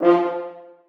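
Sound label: horns staccato e3; Sonatina Symphonic Orchestra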